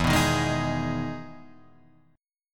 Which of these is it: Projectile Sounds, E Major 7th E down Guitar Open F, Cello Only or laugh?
E Major 7th E down Guitar Open F